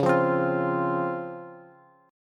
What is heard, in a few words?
Db Major 7th Db down Bass; strummed; JGuitar